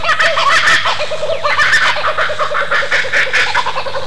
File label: kookaburra